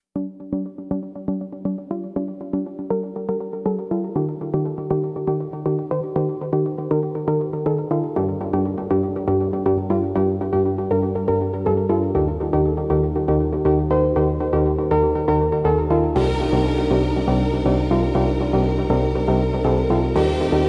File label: Electronic Impulse